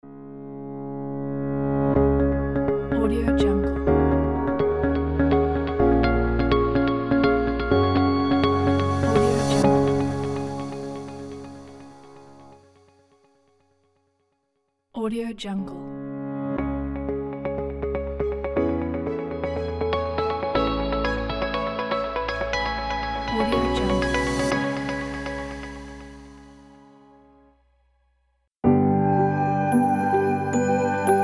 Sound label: corporate logo pack